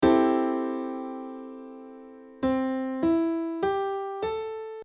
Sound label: c6 chord